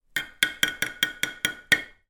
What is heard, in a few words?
spoon metal bang on teapot rim to remove drips, household